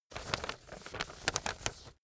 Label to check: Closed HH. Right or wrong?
Wrong